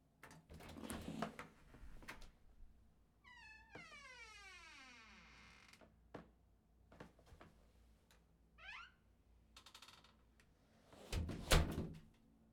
Front Door

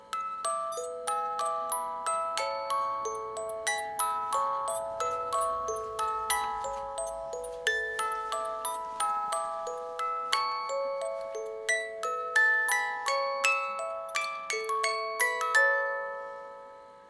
Music Box Loop

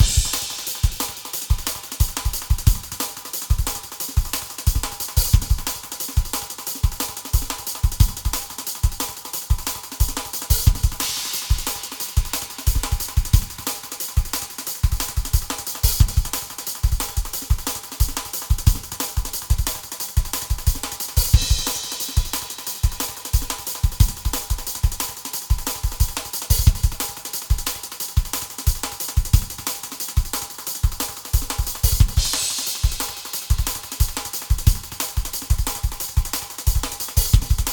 Big Time Breakbeat

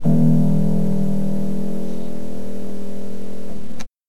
Piano

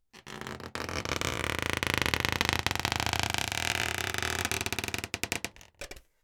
Balloon Creak Long Twist

